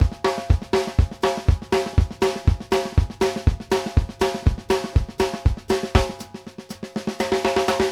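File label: train beat, drums; Sampleheads; New York City Drumworks VOL-1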